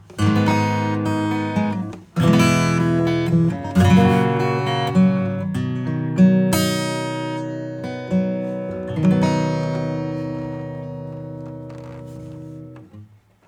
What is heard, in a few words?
acoustic Country